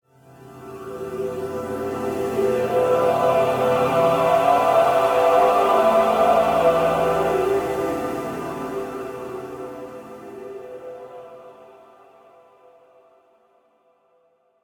astral textures spirits sprites eerie airy dark sunrise; Sonniss GDC